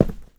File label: step; GoakeFlax